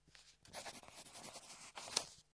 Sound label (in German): mikro nahe unterschrift